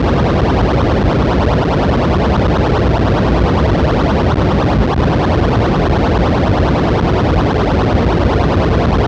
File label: Gunship Loop; Planetfire; bedlam